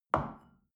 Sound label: shell place on table soft short; soundpool